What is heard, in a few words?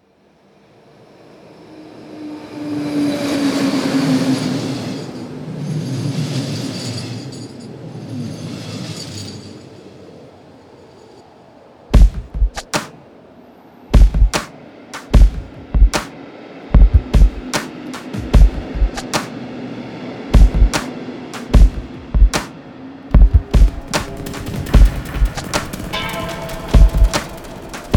train station dub